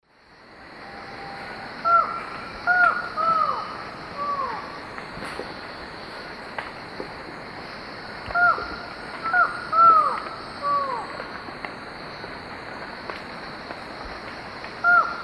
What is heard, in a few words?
Micrastur buckleyi, calls 2 birds; S. America; Eastern Lowlands